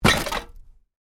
Plastic container full of bottles and cans: short hit; Impact; Miscellaneous Impact Sound Effects; Stuart Duffield